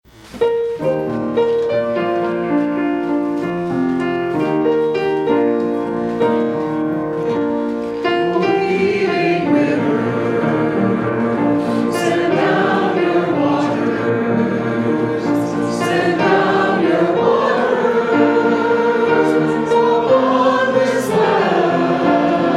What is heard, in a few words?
Hymn; O Healing River